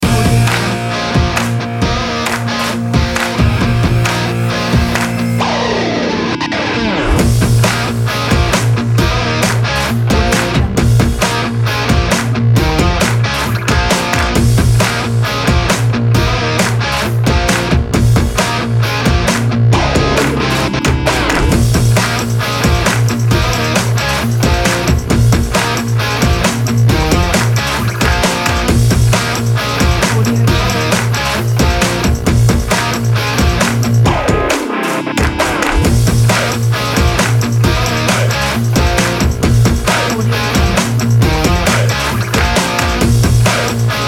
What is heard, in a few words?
stomps claps sports rock kit